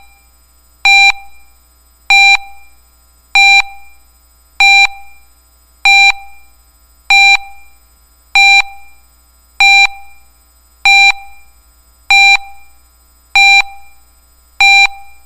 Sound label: special warning